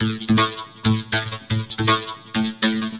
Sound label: Beat, Futurist Drum & Bass; Drums & Percussion